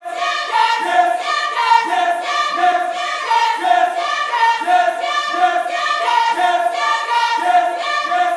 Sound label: CIR YES, Vocal Gospel CIRCLE LPS